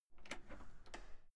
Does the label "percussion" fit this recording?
No